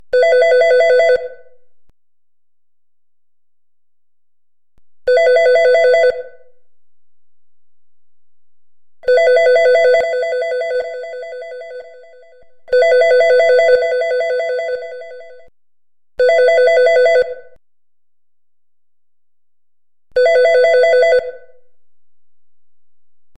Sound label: 3D Business Sound